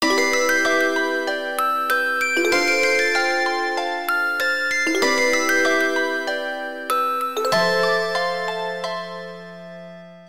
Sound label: ringtones; Fujitsu; F-02J; Lamp Shade